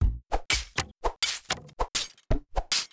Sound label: Skeleton Archer Attacks